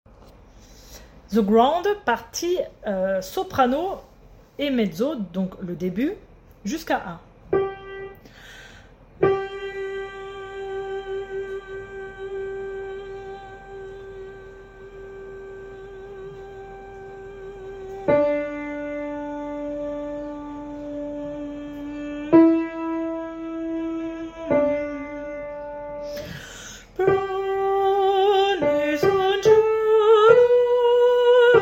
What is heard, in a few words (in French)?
soprano et mezzo